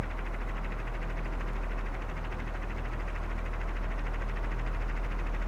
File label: electric fan low, machine